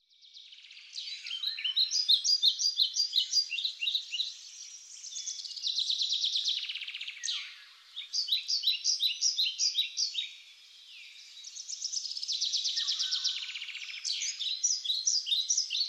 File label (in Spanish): sonidos de la naturaleza - murmullo del bosque; efecto de sonido